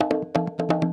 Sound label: Percussion Loops, Bongo & Conga; Houseworx